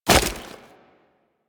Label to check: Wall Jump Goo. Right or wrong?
Right